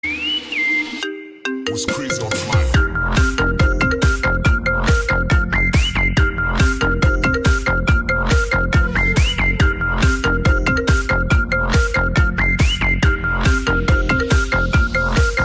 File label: iphone remix; 16 pro max